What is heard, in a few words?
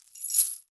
CHAIN Drop